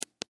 Smart Phone Button, Push; Technology; Cell Phones

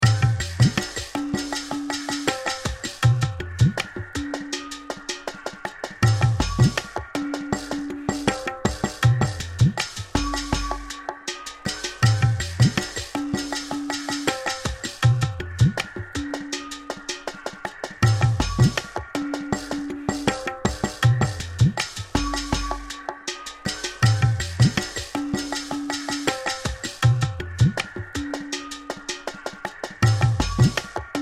Tribal Tabla Percussion Mysterious Loop; Drum; Blastwave

